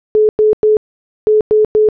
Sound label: phone call